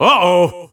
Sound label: Oh oh